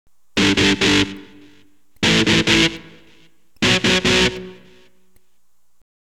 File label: E GTR POWER CHORD